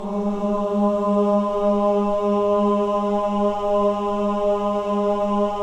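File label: choir ahs G#3; Spectrasonics; Symphony of Voices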